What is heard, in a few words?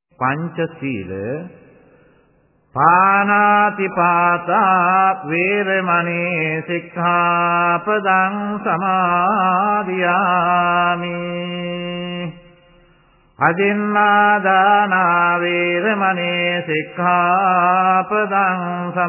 chant, Buddhist Chanting